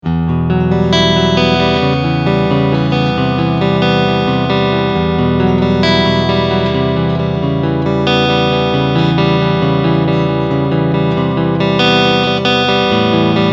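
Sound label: Front Humbucker Clean; SC Mode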